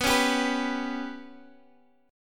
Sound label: B Suspended 2nd Sharp 5th B Guitar Drop D, chord